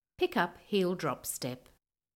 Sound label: Silver Medal Pick Up Heel Drop Step